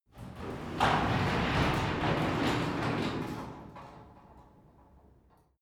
garage door open interior

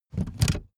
car seat slide forward short

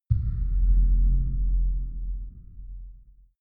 far outer space shadow, hits impacts

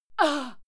female fight pain short

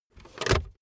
car backseat table pull up fast hard

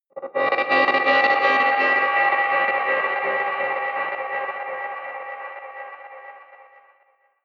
stutter breach, drones

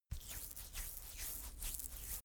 rubbing hands; comedy-cartoon; koerpergeraeusche

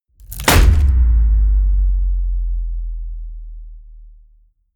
hit wood, hits impacts

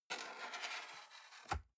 cardboard box open unfold scrape single; objekte

